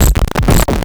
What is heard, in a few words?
grind, EMU XL-7